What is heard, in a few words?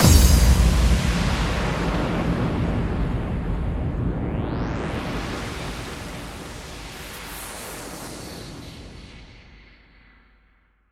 Bassdrums FX, FX Kicks; Vengeance Samples